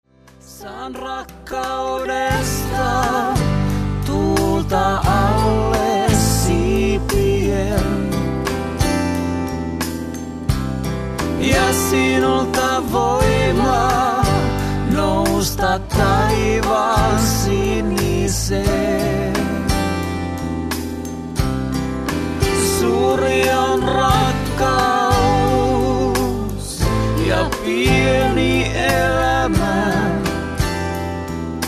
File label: unplugged